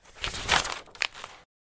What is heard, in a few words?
page flip